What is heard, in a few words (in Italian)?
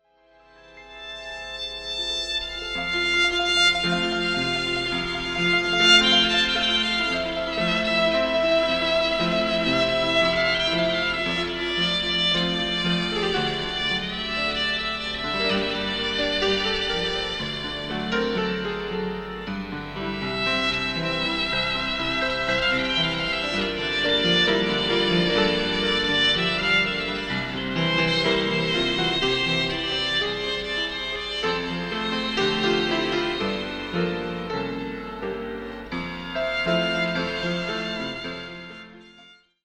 VIOLINO